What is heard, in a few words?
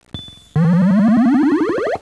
frog